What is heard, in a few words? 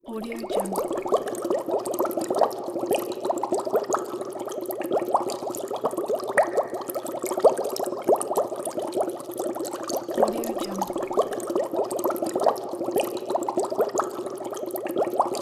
underwater bubbles